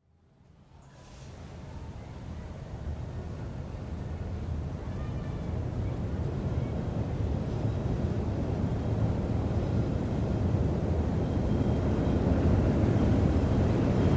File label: Elevated Train Under, bys